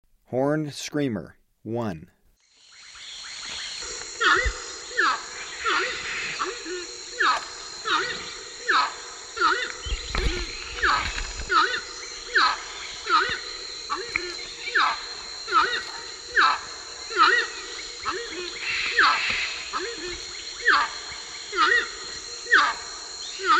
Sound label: Horned Screamer, Birds of Ecuador; S. America